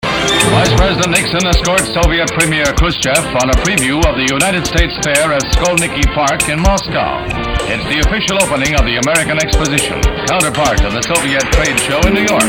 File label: Thunder Chords